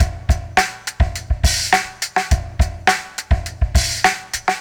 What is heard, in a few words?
Crunchy fx 104, Beat Loops; Zero-G; Monster Beats